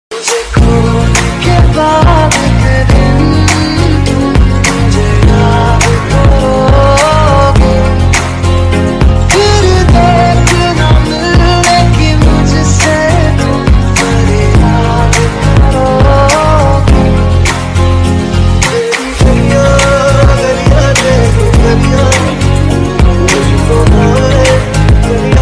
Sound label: bollywood ringtone